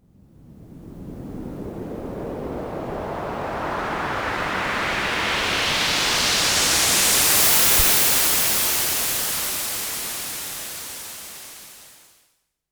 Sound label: Long Ms20 Noise Sweep